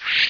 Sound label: swish